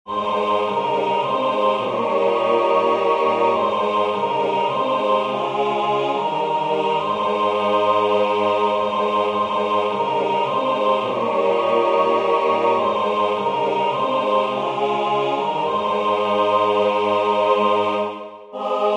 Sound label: oi suomi katso 4 voix